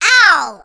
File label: pain; alienarena; invader